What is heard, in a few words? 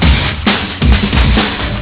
music